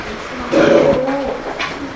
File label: patas monkey otome hiss